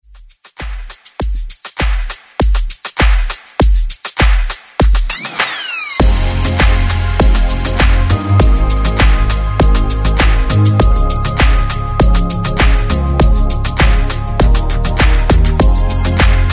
Redrums

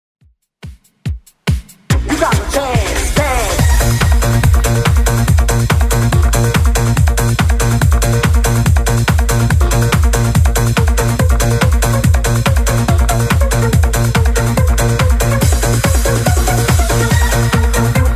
2ks Redrum 142bpm

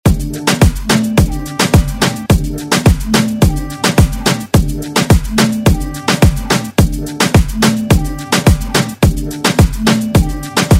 Moombahton Redrum

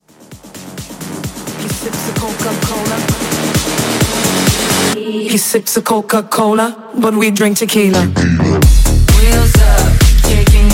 Pop Mashup; Remixfreakz

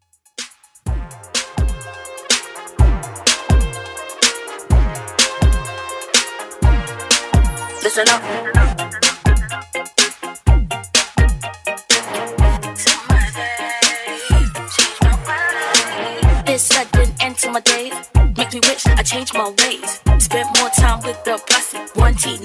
Oldschool Intro Outro Uptempo 125 BPM

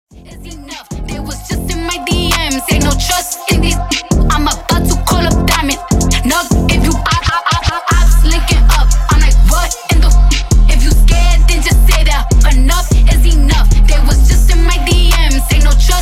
WORDPLAY SEGUE

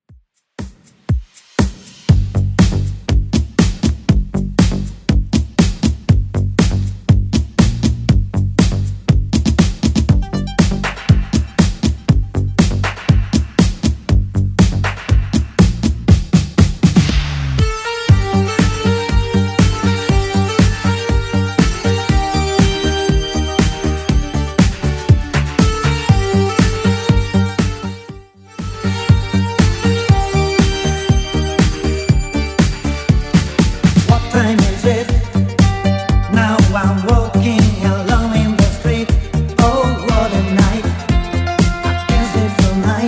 80s Redrum 120bpm

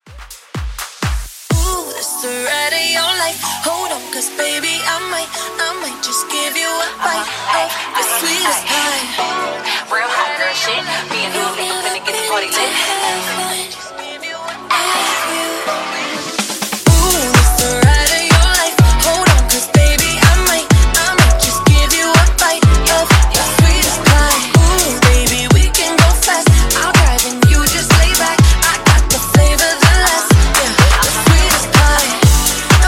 Dance Redrum 125

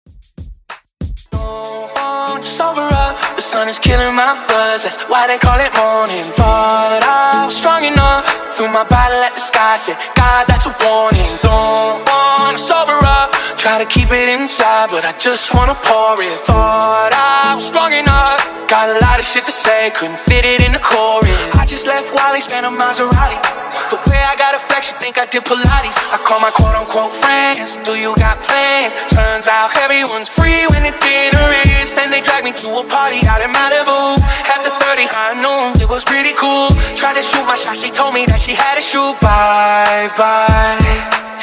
Re Drum No Intro Outro 95 BPM

